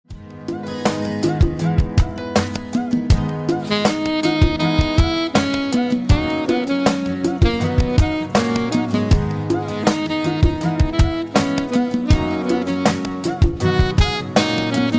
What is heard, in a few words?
cover saxophone